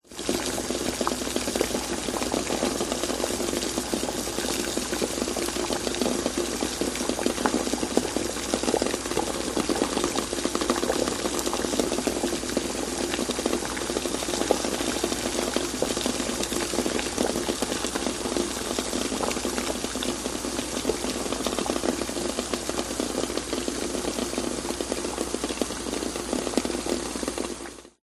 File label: food eggs boiling metal pan water; zapsplat